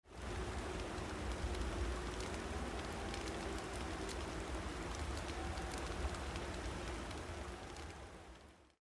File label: Cold Ambience; Age of Empires III; cinematics